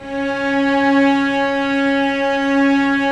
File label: STR, Vcs mf Amb